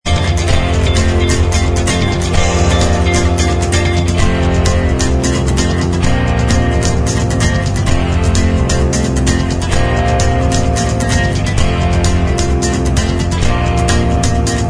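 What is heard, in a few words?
instrumental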